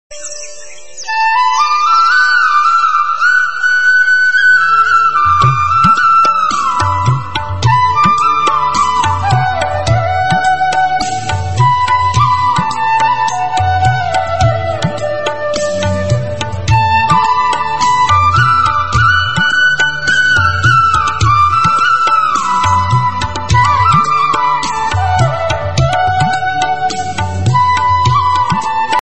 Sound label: bgm instrumental ringtone